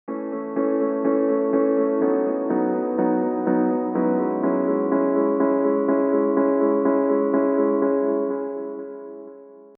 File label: Piano